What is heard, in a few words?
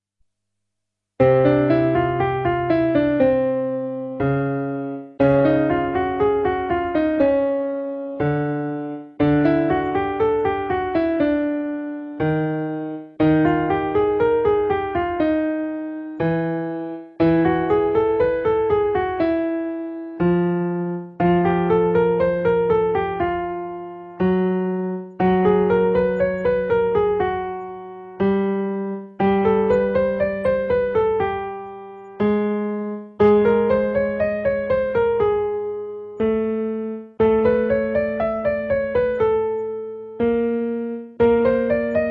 Basic Scale